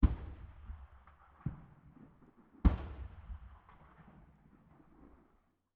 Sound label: ambient shot stereo